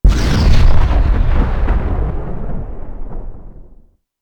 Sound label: explosion petrol medium, bomb; gbombs 5